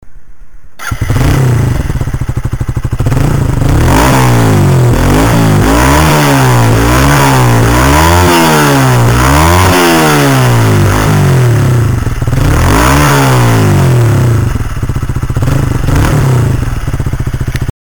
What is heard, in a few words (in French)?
echappement; Certification : homologué; DEVIL